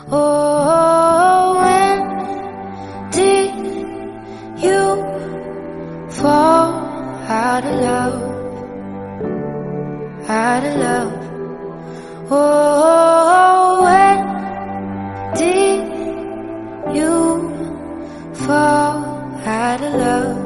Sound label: most romantic love song tone ringtone, english ringtones